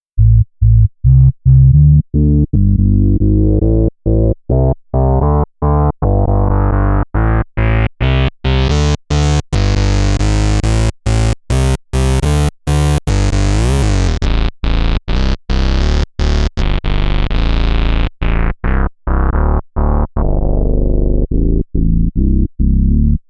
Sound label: Electro Harmonix Bass Mono Synth Sub Ctrol full